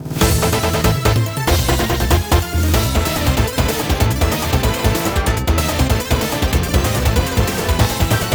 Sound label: Combat Miniboss Full